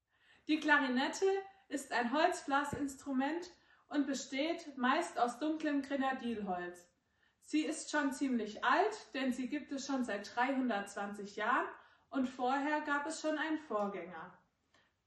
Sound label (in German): Klarinette